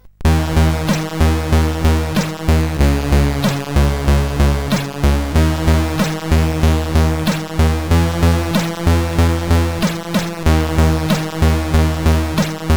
Sad, sid CSG8580R5

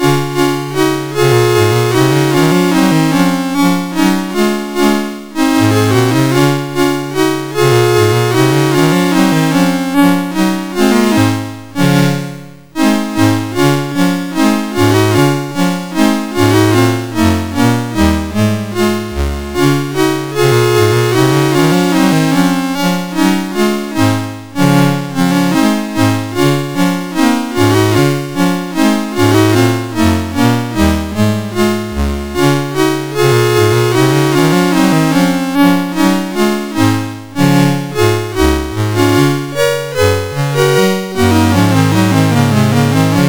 Chorale, MOS6581R4

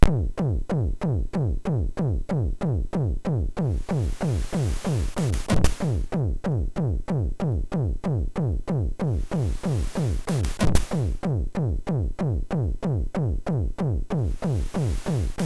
Heavy, MOS6581R3; Early Tunes

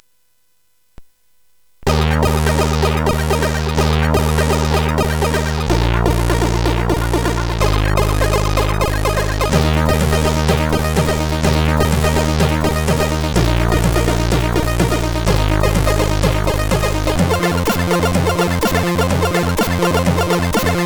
Intro, CSG8580R5; X-ample; hvsc

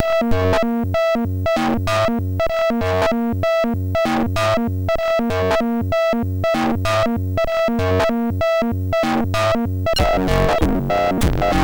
Lento Violento, sid MOS6581R3

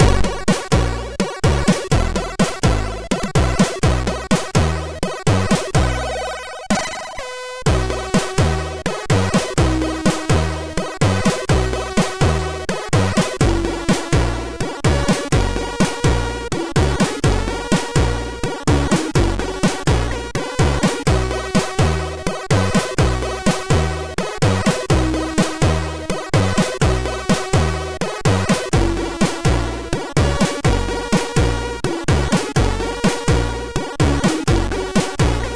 Tango, sid MOS6581R4; Lizard

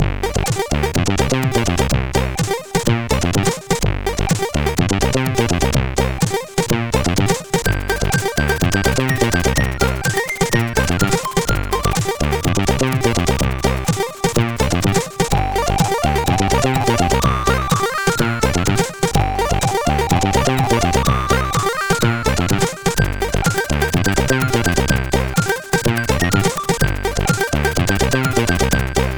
Techno Mix

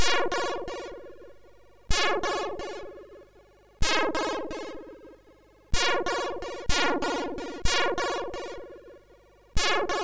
Noise

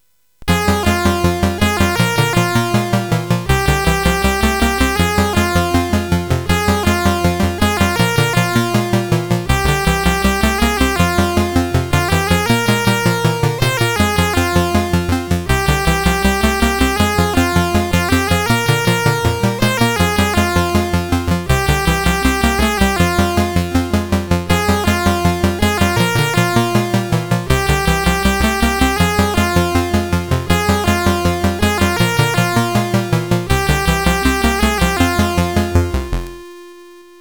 Demo Music, CSG8580R5; Demo Music 2

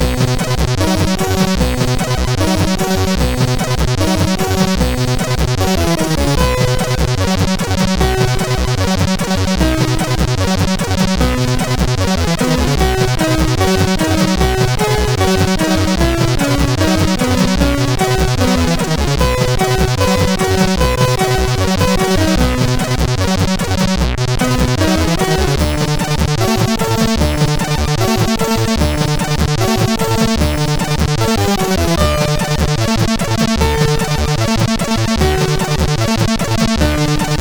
sid CSG8580

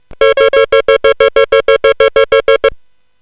Synth Sample, CSG8580R5; hvsc

